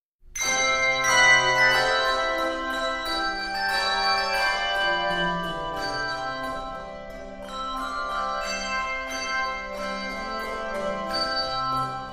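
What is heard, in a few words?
Handbells; Chorister's Guild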